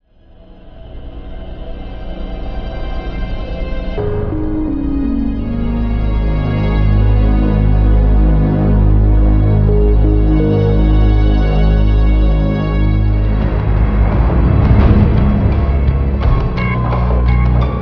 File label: facility music; umbrella